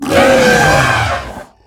hurt, enemy, combat; CosmicRage; baurg

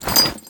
build, ships; ratika

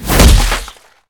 kick; CosmicRage; general combat enemy

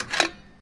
fuel line replace, ships; CosmicRage; CosmicRageSounds

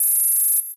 force field

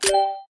commpack green

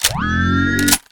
reload; CosmicRage; combat; ENEMY

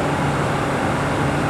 loop, highway

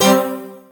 intel

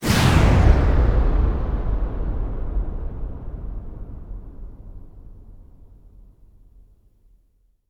jump generater, ships; CosmicRage; waypoints

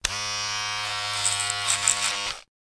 shave

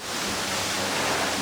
hose; Cosmic Rage

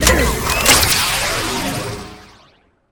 laser, combat suit

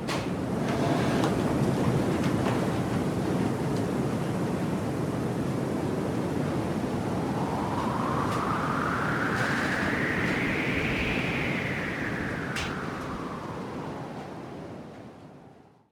wind dwelling, weather

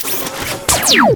stun gun

